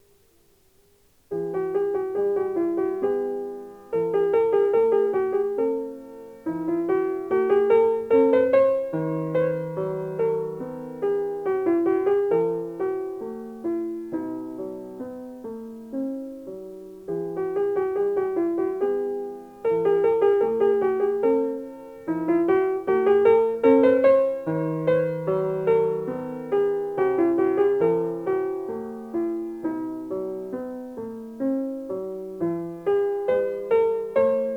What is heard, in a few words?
Adagio Teneramente